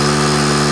engine; cstrike; next21 kart

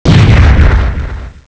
explode; cstrike; bomberman mod

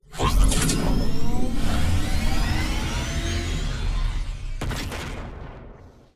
turret death; cstrike; pubg

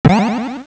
kick; cstrike; bomberman mod

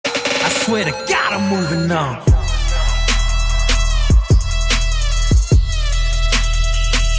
win sound; pubg

